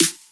Snare

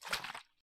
Water

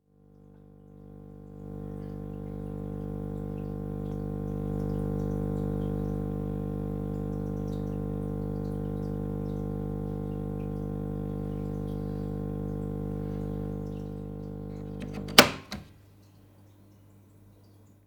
Hum

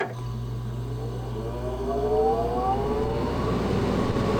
Engine Power Up

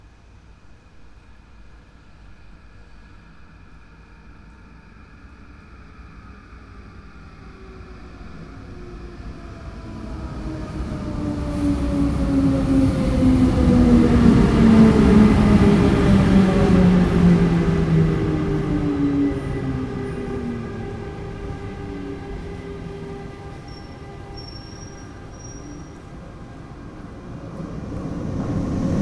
Train Entering And Leaving Station

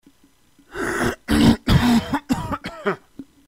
Clearing Throat

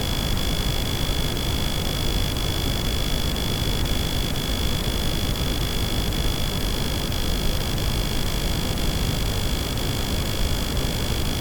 Electric Stereo Buzz

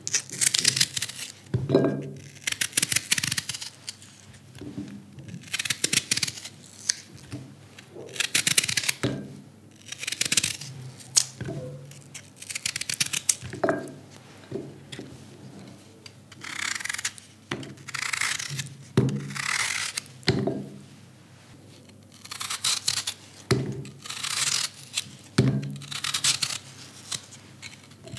Slicing

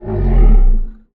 Big Monster Breathing

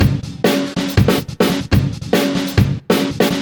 Broke Loop 140bpm

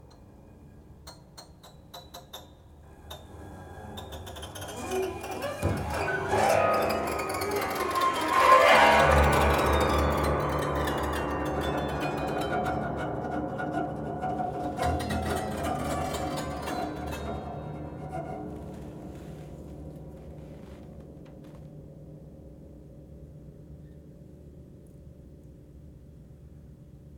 Swell Sting

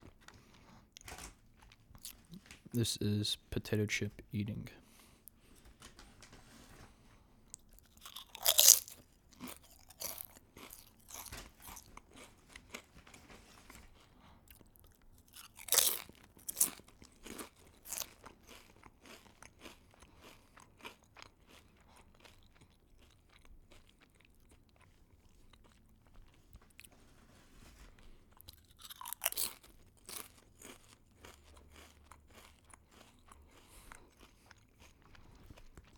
Potato Chips Eaten

